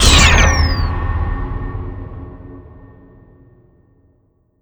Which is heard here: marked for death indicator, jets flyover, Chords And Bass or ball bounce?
marked for death indicator